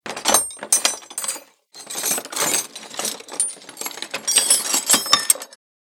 Toolbox searching rattling and movement

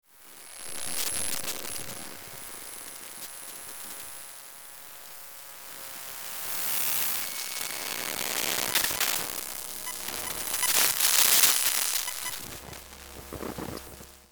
Laptop glitches and noise

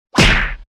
Punch hit hard

